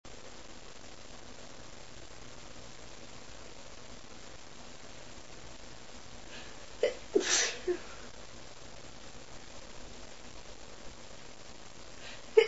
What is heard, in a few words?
triple tickle, female